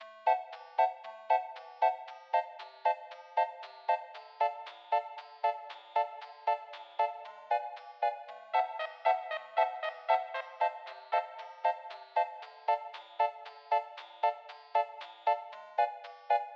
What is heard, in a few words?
Main Theme Radio Version INTRO; Death by AI